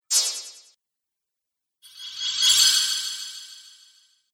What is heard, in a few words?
Magic Spells; Magic Sound Effects